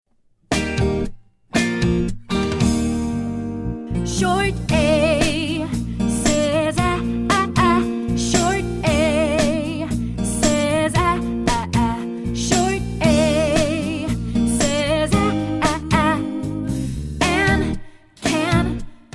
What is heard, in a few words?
Vowels - Short: Song For Teaching Grammar; Short A says aaaa aaaa aaaa